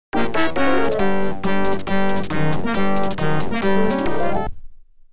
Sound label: OPL2; Imperial Fighters Arrives; X WING